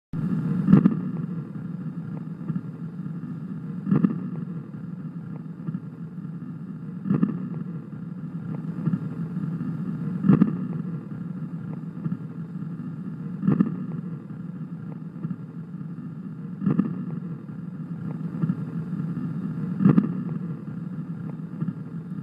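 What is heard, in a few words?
Crackles coarse; Cracles coarse; simulatorvzorky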